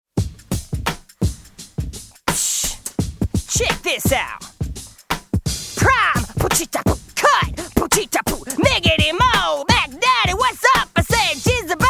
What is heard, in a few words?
Scratch Vocal